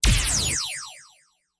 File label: ui press play; The Powerpuff Girls - Trail Blazer; NextlevelGames